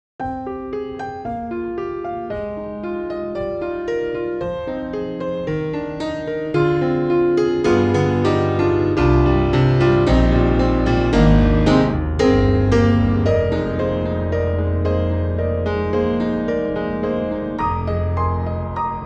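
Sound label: Lots Of Noise, piano; Native Instruments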